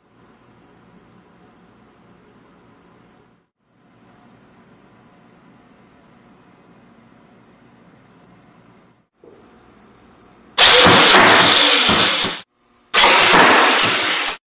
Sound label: flipper test, robot; omegaforce